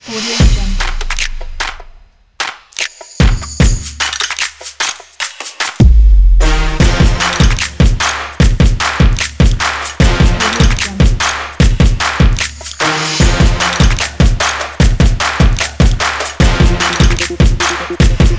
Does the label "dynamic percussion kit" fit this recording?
Yes